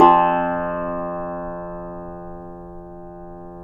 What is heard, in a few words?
tamb; Roland - LA Composer vol. 3